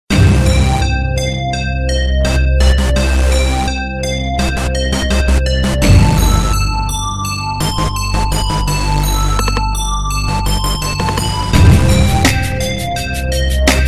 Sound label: black beat, hip hop